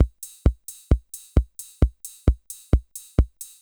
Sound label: Beat, Old Skool House, 132bpm